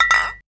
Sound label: Wine bottles clinking; buoi tiec